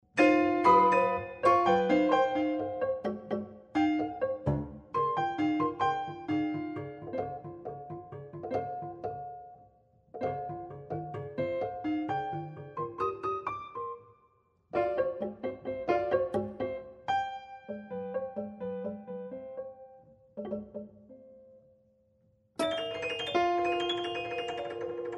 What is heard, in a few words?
sonatas and interludes for prepared piano sonata; cage; sonata 2